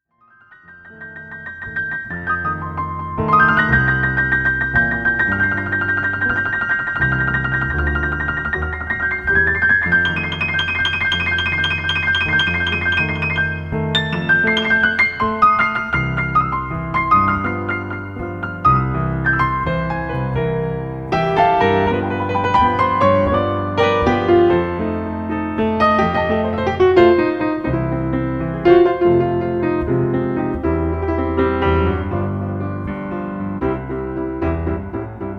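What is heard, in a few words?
Inner Voices, Piano Solo; Boogie-Woogie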